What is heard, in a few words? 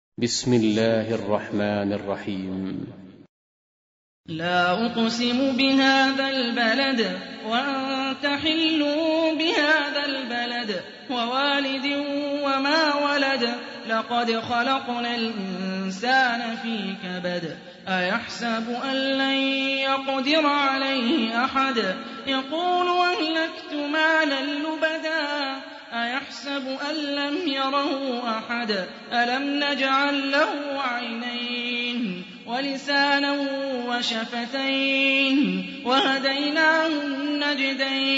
Quran; Hani Refaey